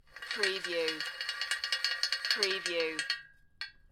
Metal Chain Pulley - Rattling Chains

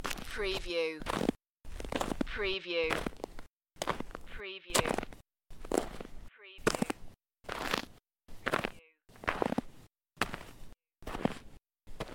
Snow and Ice, Footsteps snow and ice

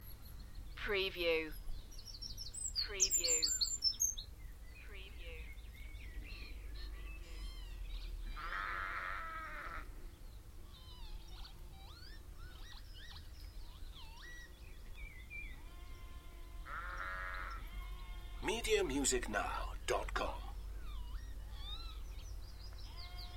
Spring birds song and sheep Beacon Fell Preston; beanstalk audio

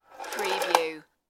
engine start belt

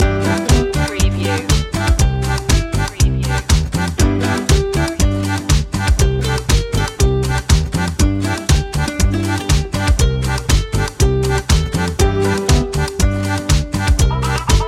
fun in the sun no vocals loop

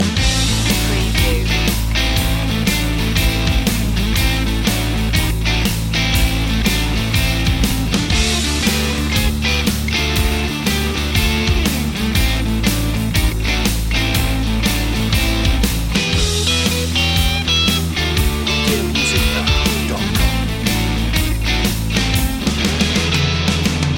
blues loop

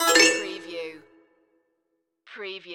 Magic Chime

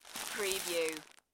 Bread Wrapper Remove; sound effects bible

